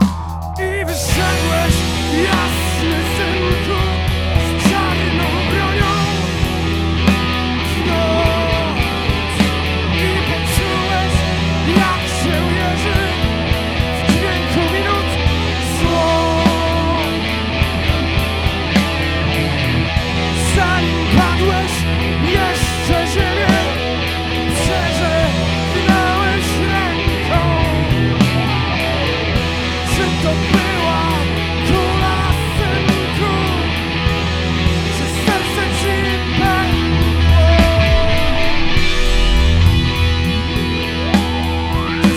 unmixed, rock; mix-mastering

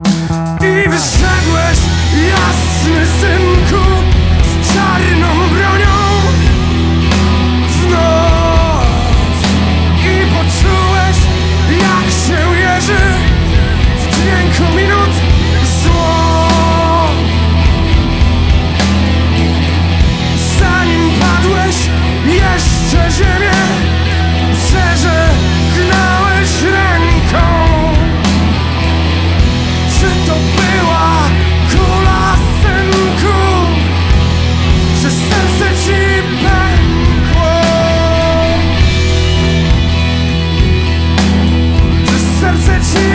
mixed, rock; mix-mastering

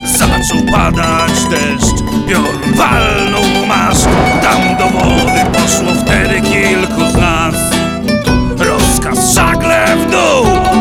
unmixed; mix-mastering

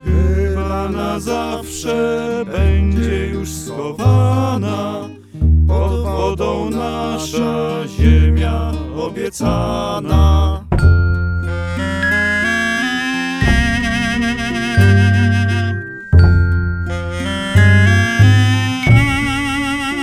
unmixed, acoustic; mix-mastering

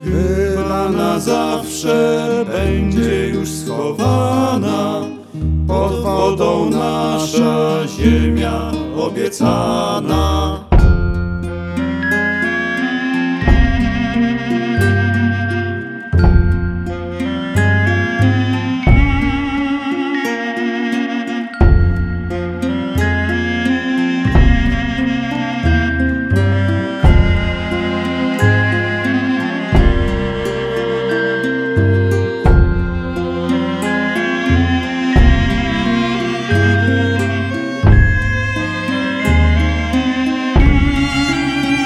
mixed, acoustic; mix-mastering

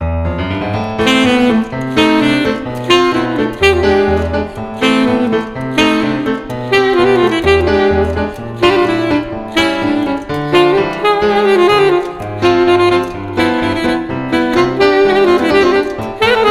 tango mixed, jazz; mix-mastering

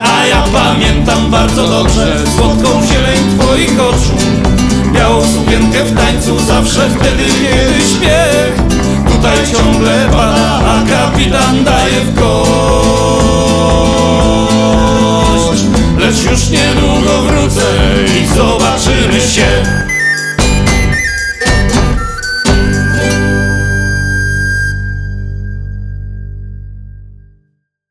mixed; mix-mastering

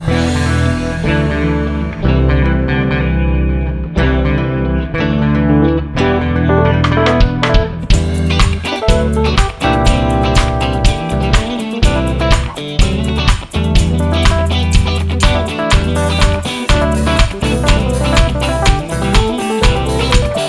mixed, funk; mix-mastering

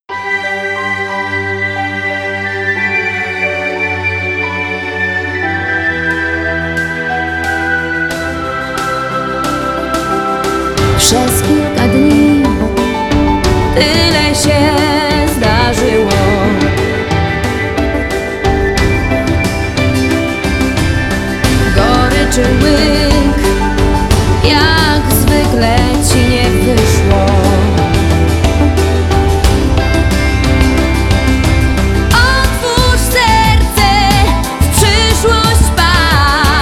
mixed, pop; mix-mastering

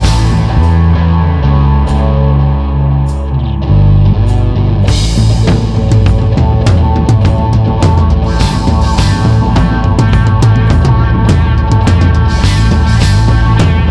outro ambient; rock; mix-mastering

